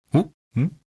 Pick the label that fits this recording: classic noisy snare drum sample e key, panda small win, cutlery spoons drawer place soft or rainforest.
panda small win